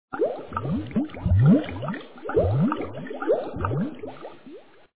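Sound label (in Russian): пузыри водяные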